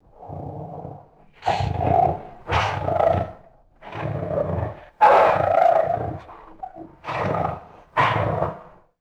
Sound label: deep guttural siberian tiger growls; animals